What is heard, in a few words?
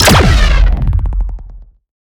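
Shot Large Blaster Distorted Cruncher Boom; Robobeat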